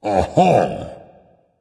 agree dragon; fafnir

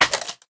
clap; smite; arthur skin9

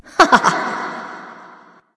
heh heh light; smite; voicepack; hel

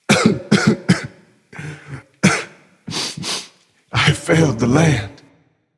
boo hoo; smite; olorun

